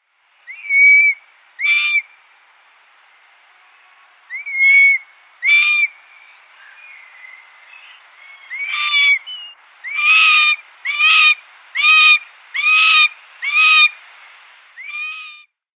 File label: Long-legged Buzzard nestling